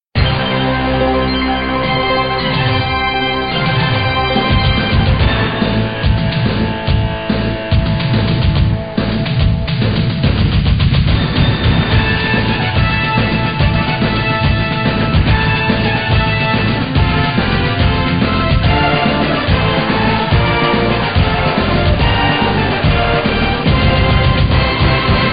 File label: ringtone